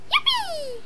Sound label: Jupeee